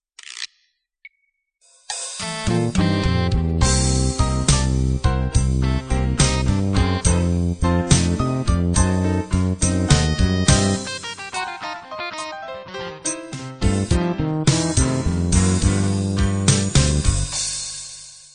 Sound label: slow blues intro